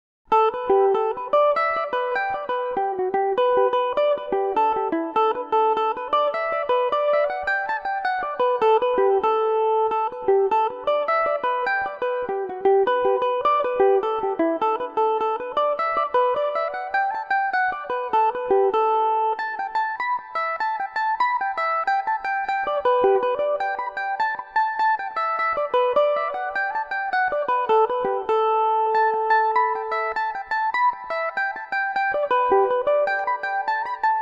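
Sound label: billy mc cormicks A dorian revelation, mandolin; Revelation Jaguar electric mandolin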